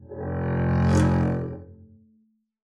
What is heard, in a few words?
arco f1 f up, double bass; dsmolken